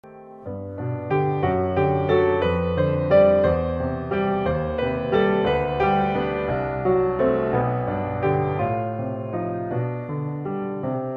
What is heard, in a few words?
Lullabye and Blues